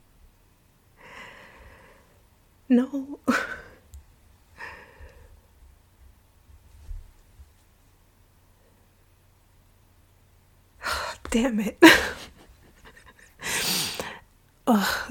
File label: sneeze bloop